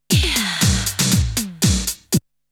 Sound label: Drumloop; ZERO G - Datafile DISC 2